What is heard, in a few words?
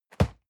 box hit medium